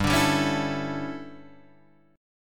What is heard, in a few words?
D Major 7th Suspended 2nd Suspended 4th